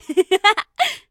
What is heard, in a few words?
Laugh; hero voice clips